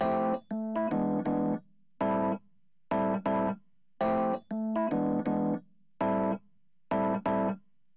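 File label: wet epiano, Hybrid neural guitar overdrive; souldriven; Morphdrive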